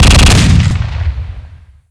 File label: 4 barrel shoot, weapons